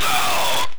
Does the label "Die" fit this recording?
Yes